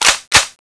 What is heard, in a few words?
bolt pull; weapons